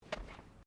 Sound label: Footsteps Bare feet Carpet Step